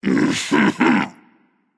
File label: clear throat, voicepack; smite; jorm